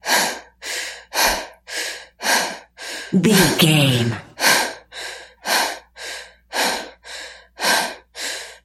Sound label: voice female breath jog loop